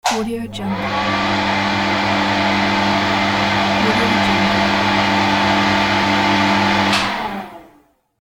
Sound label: dryer